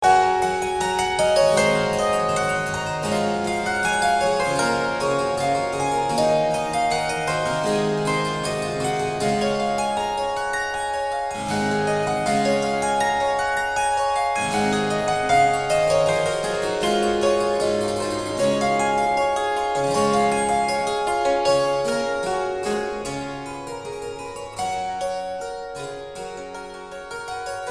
dulcemele

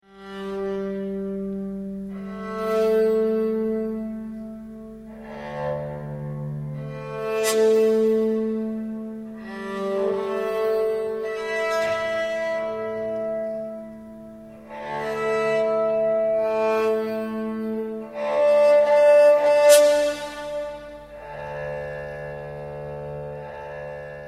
trompa

